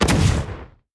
hero stones explosion; sfx

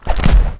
book; sorcapprentice

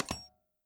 GUI Open Tower Menu

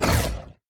dragons enemy shadow evolved attack; sfx

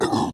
dragons enemy lava tanky death; sfx

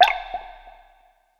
ambience underground

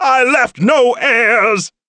death

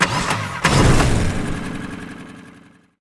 engine sucess; crocs

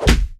quick feet melee; crocs

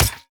tower dune Sentinels basic Attack

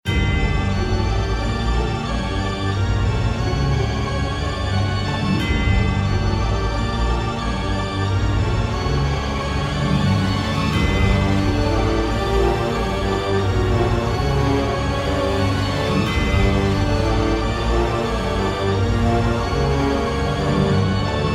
Halloween Moon

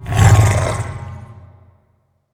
consume; grimdevourer

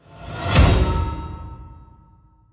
shield; ainyl

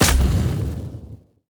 tower dwarven Flamespitter blazing Trail cast

